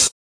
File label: hat; Hats